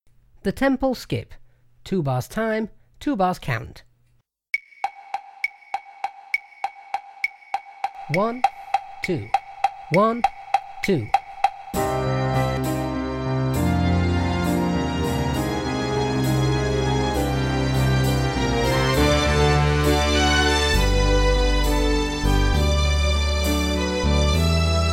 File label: The Temple Skip Backing, drum kit